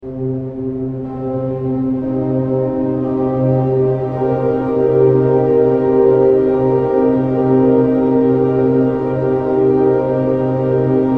string model

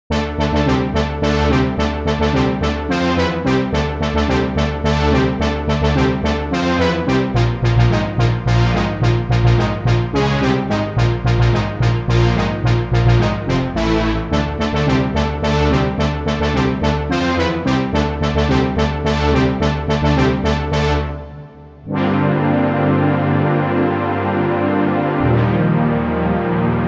supersaw, sampler

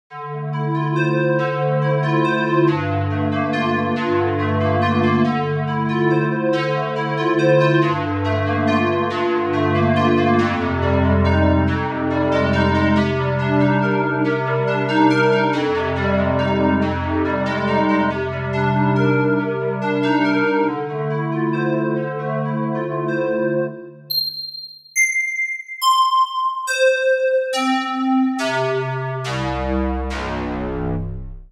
Noise Square, struck instrument simulator

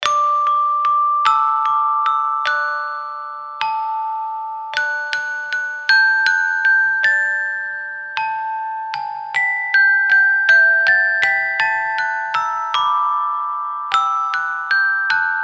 glockenspiel

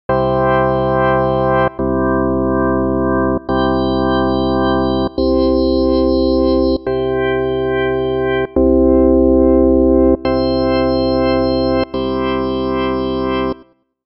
hammond

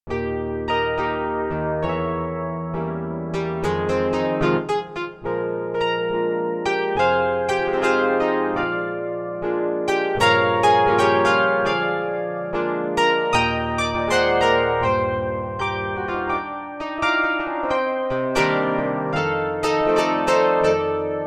karplus, sampler

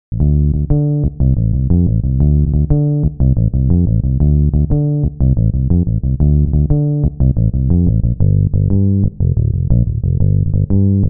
bass

